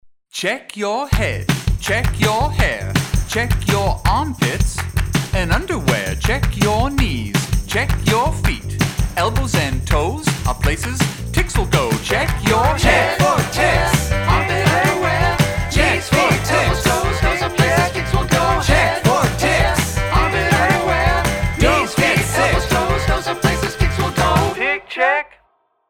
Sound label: TICK CHECK dance loop